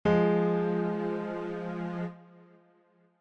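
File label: F minor 2 note F A Flat chord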